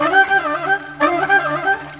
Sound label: World Lick